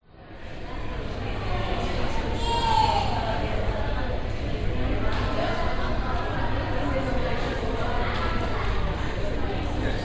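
public places cafe museum busy people chat interior brisbane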